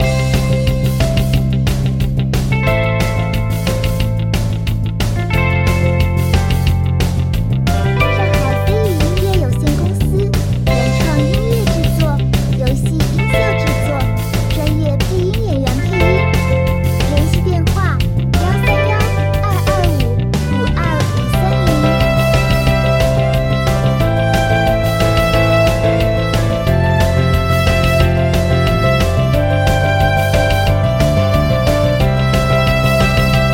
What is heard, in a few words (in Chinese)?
电子时尚轻快; 换装